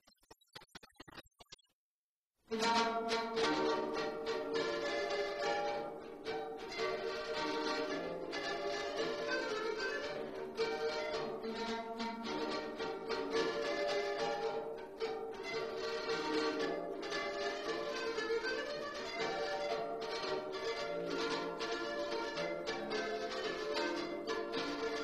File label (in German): A Polka